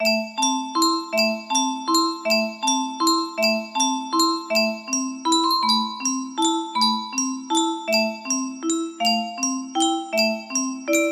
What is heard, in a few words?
music box, music box melody